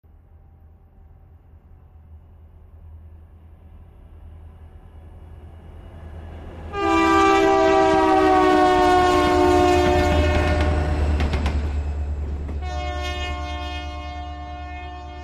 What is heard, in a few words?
Train Horn Doppler